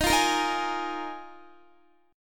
D sharp Suspended 2nd Suspended 4th D sharp Guitar; D#sus2sus4; strummed; x,18,15,15,15,15